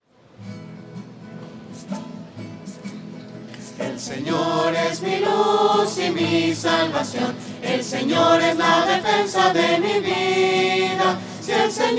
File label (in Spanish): voces; misa